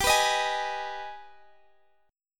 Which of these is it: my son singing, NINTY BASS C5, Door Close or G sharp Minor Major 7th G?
G sharp Minor Major 7th G